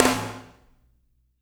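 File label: snare, left; Spectrasonics; Burning Grooves CD2; HIT ME KIT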